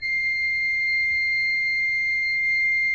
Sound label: C6, Cathedral Organ; Propeller Island; ITAL.PRINZIP